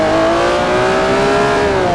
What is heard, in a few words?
second, vehicles; gmod; tdmcars; enzo